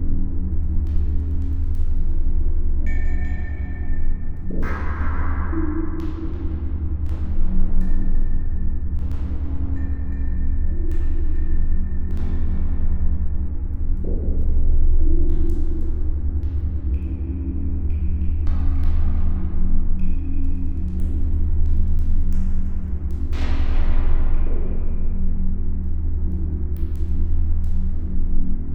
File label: background music